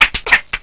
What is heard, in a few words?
Fight; 特酷声音素材库